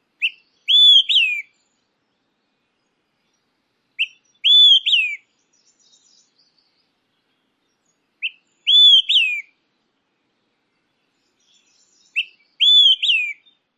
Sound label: song; CA